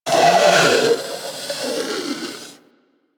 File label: Predator Snore; Warframe; Hunting Critters